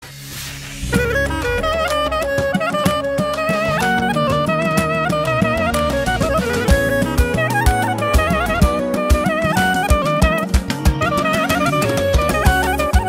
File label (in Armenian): klarnet